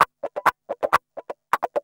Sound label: GUI, LICKS; 130 LICKS